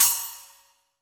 drum crash sample a key, Royality free crash cymbal drum sound tuned to the A note; cymbal drum samples